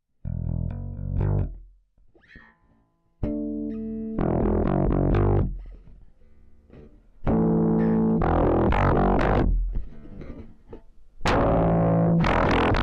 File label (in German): Wavefolder Vergleich Intellijel Bifold E Bass